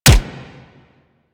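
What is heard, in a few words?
machine gun shot; Weapons; Archwing Mach Gun B; Warframe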